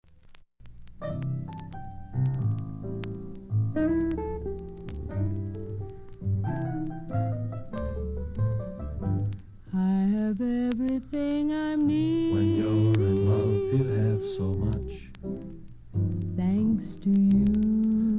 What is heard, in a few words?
Female Voc with male bgv's